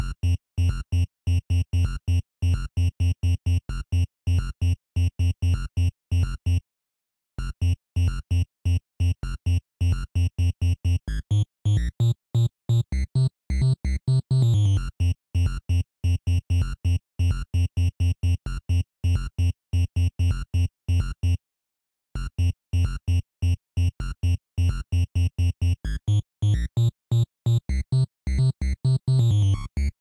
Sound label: Jungle Bass